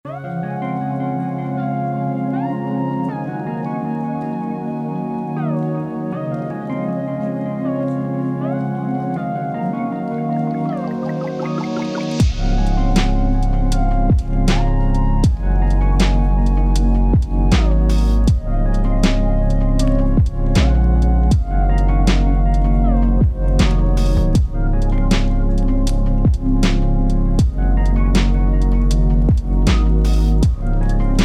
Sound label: Instrumentals